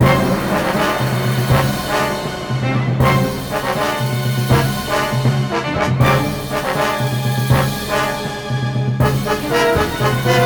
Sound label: bossfight; rocketmen axis of evil; xbla ps3 xbox 360